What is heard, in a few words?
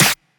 Clap